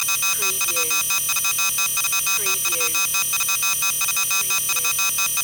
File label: Digital Readout